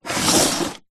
industrial tool box small plastic slide on screed concrete floor